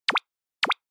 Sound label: Anime Motion Double